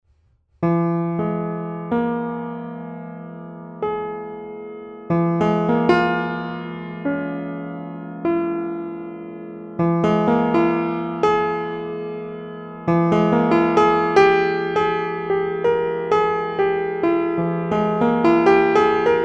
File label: meantone with stretched octaves